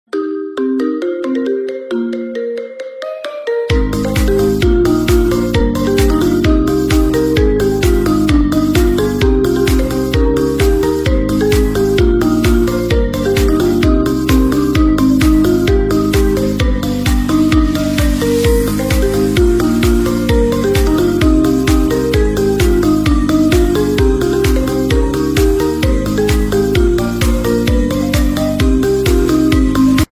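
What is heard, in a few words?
Redmi Note 13 Pro, 着信音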